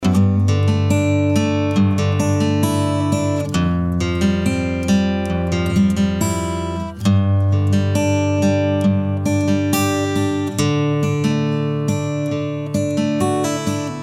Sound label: Ac Guitar Pultec Pro + Fair Child